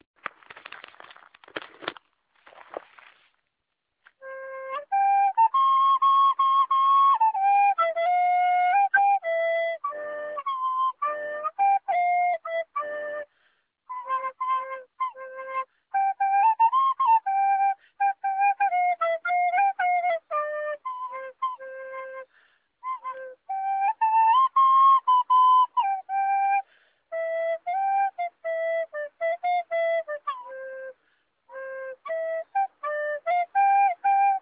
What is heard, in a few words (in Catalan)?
flauta